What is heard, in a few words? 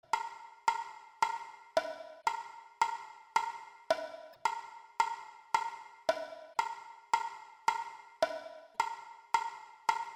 metronome bongo